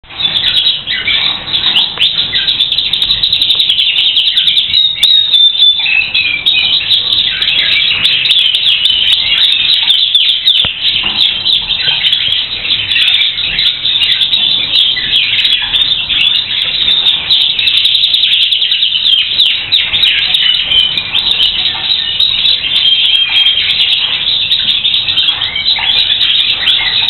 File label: Canary, Bird Songs